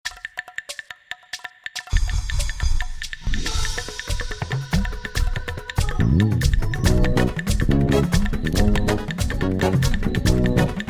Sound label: Gospel Medley